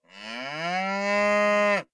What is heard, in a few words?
cow ack; propack